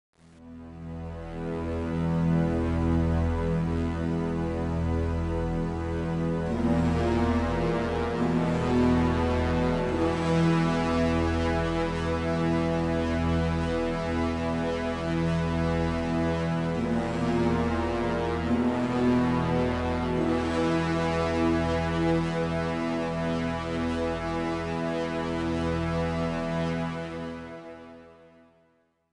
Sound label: Background Music